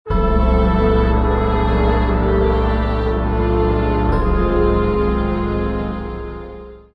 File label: Game Over Beat; Dungeon Defender; DunDef